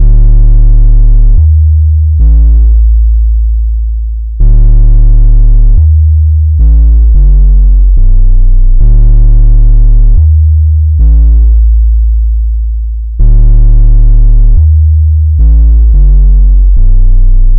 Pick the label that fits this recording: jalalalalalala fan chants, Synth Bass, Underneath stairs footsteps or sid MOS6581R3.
Synth Bass